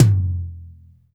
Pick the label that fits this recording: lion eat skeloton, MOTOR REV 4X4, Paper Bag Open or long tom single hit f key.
long tom single hit f key